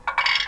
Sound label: Percussion Croak